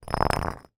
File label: foley brick short drag on hard ground; zapsplat